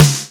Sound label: OPEN SNARE 1; Greytsounds; Scott Peers Sound Engineering